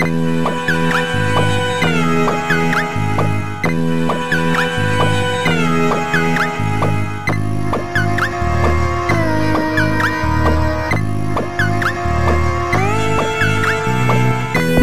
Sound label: gas leak